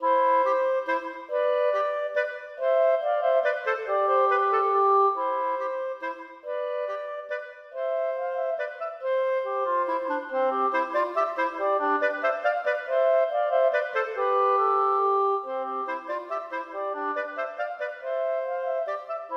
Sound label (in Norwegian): Menuet for klarinett duett; Aamodt 014c